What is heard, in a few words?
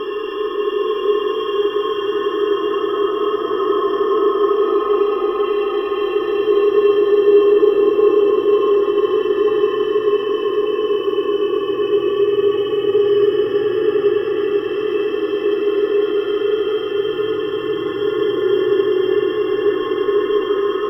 Space Exterior Amb; Ambience; Space Battles; Warframe